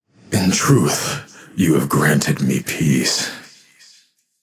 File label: death, voicepack; smite; vamana